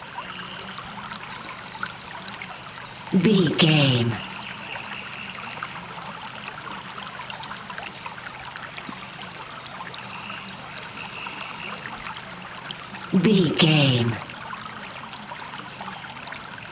river stream daytime flowing water insects birds loop